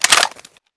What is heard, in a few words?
famas clip out; czero opforce